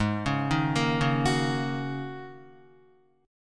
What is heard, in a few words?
Ab 7th Suspended 2nd Suspended 4th, Guitar, 4E,4A,5D,5G,5C,6F; Ab7sus2sus4 arpeggiated; 4,4,1,3,1,1